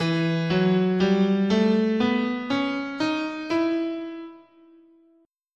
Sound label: E Melodic Minor, scale